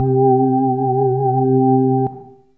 ORGAN; AMG Megabass REMIX! VOL-2

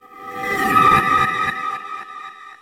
Reverse Fx; musicradar

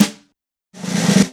Yamaha CS6, Drums

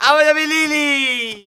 Flamenco, Vocals, Male

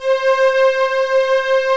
OB, OB modular; Greytsounds VOL-1

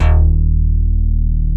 PPG BASS

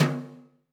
brush tom; Greytsounds; Scott Peers Sound Engineering

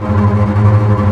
TREM BASS; E-MU Formula 4000 Series Vol. 5; Protozoa; Proteus2 Instrmt

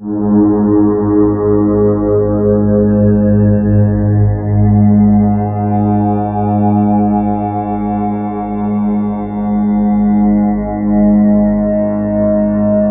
BIG SWEE, SYNTH SND, Ominous Drones; USB Soundscan vol.48; AKAI

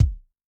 Bottom, Kick, Yamaha DTXpress-II

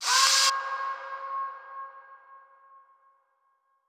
SFX, Trance NRG; Sonic Foundry (Sony Creative Software); Trance NRG VOL-2

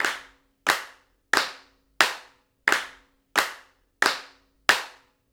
PERC LP, Arabian Traditions

